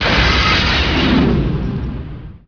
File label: crash ice